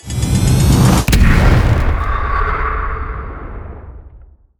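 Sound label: skill black explosion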